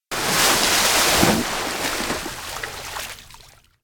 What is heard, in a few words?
up from water; RadwinIsland